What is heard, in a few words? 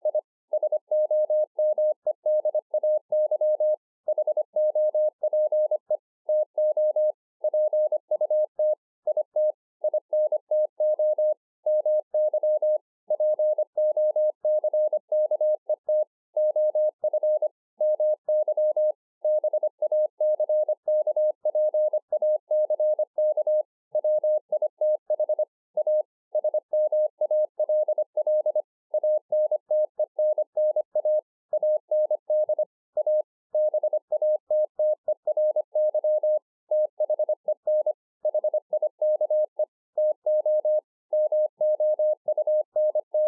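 25 WPM, morse; A SMALL TRANCEIVER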